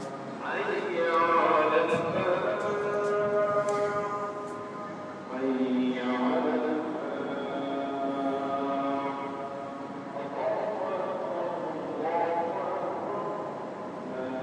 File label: call to prayer dubai